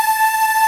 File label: Ending loop